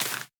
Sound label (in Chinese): 竹笋放置声